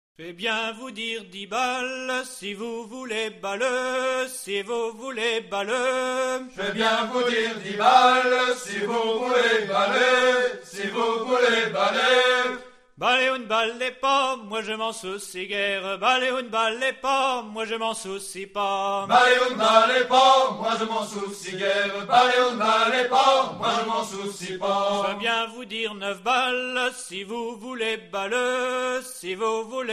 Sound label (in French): chant et veuze en presqu ile guerandai; tradition vivante de bretagne; presqu ile guerandaise